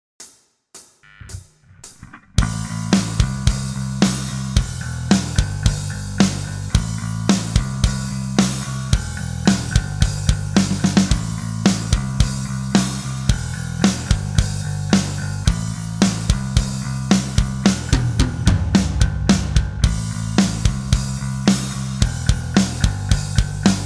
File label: Backing Track; rock guitar mastery